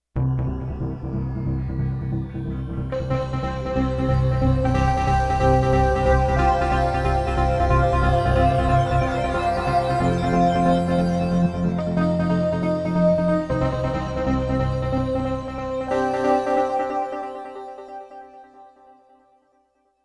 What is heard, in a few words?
Space Rays, Roland VC-1